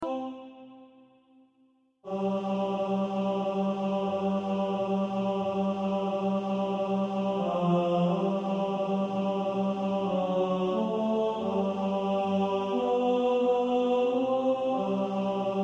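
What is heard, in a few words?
tenor, practice music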